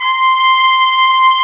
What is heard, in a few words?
TP C5; AKAI S-Series CD-ROM Sound Library VOL-2